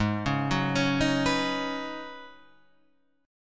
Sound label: C sharp 9th Suspended 4th G sharp, chord